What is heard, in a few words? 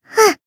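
damaged, Japanese; nagi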